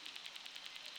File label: Background Noise Clips